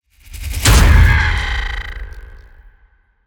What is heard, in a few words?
Cinematic Hit; soundbits